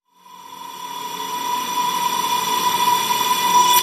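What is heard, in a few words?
Whoosh Expanding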